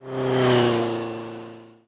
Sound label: car, highway